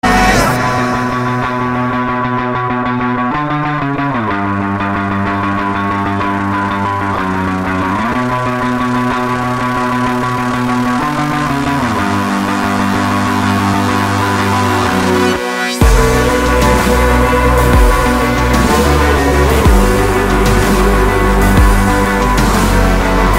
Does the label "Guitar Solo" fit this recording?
Yes